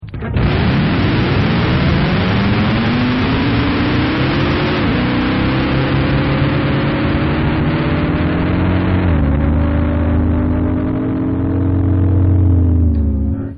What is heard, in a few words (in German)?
auto